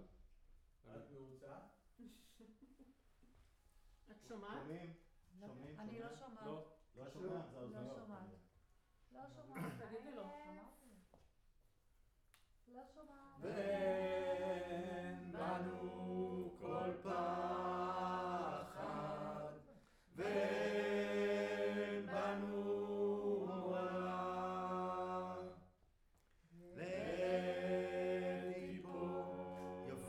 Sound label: Room, Choir